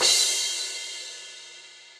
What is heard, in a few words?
Crash 17 Classic Muffle, Cymbal; Drums; Drum Hits; Standard Hits; Session Drums Studio